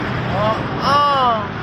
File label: hooh